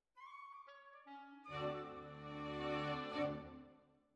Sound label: Violins Over